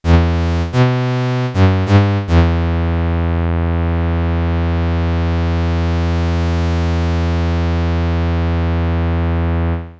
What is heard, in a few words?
1985 JX-8P VCF